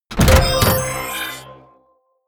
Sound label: sci-fi click positive heavy; hits impacts